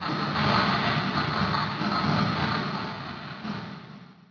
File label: rubble; hl2